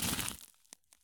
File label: soil stop; Escape from Tarkov